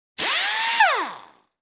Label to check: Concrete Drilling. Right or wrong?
Right